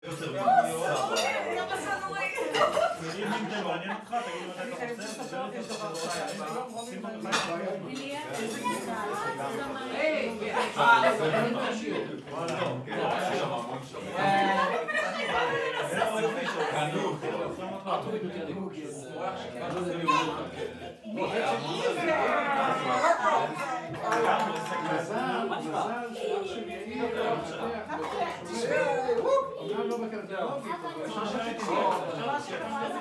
walla dinner Hebrew voices; atmosphere

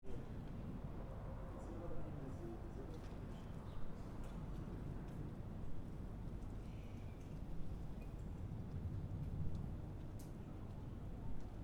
Room tone low voices from out; atmosphere

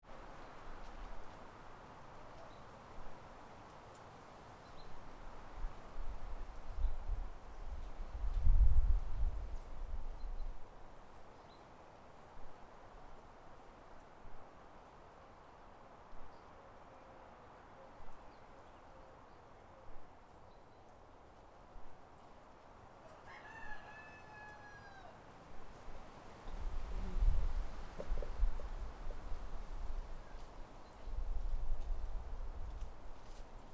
country dog bark birds rooster; atmosphere

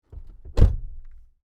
car door closed internal; spoteffects

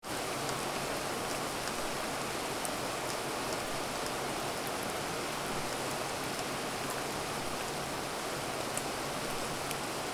Rain thunder internal; spoteffects

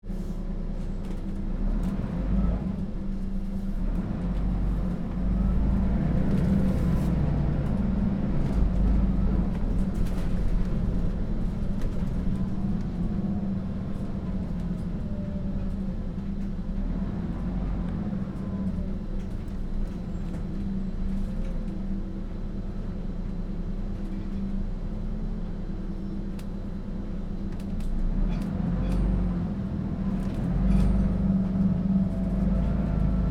bus ride internal; spoteffects

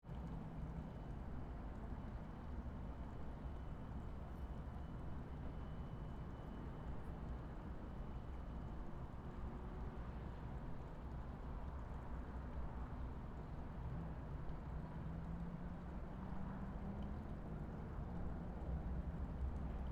harbor 5am quiet, atmosphere; Miami; Skyliner

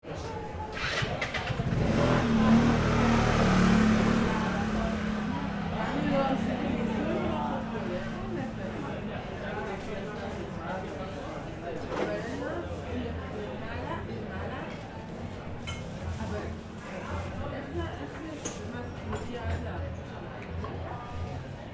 Bar cafe outdoor night bike; atmosphere

